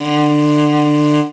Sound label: L, morin khuur, World Instruments; Best Service ProSamples vol.52 - World Instruments 2; AKAI